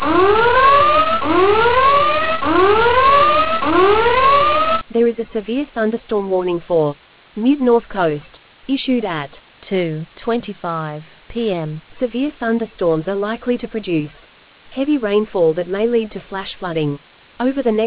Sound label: storm audio